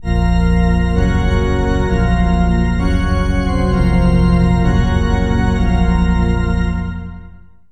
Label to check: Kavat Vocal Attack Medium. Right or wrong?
Wrong